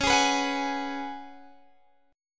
C sharp 7th Suspended 4th, C sharp, down, Guitar, Drop D